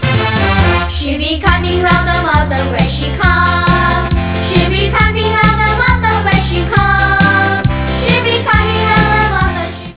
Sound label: children